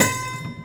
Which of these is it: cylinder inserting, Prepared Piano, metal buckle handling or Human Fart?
Prepared Piano